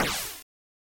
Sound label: sfx sword hit; LD55